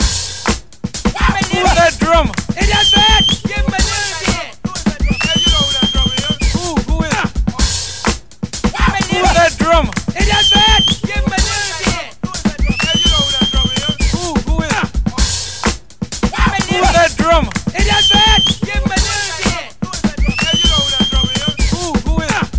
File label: 126.5 breaks; Who's That Drummer; Lockdown Breaks